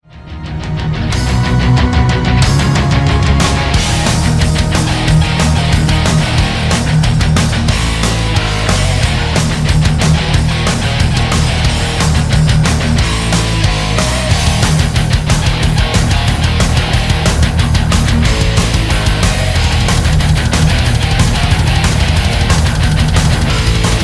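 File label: Palm mute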